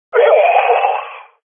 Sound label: death